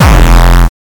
Bass

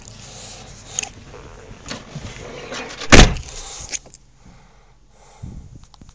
Car Door

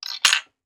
Coin

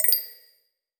Aliasing Chimes

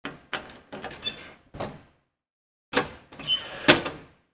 Oven Door

